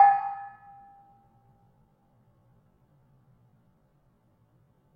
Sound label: Barung 4 G Sharp, gamelan tuned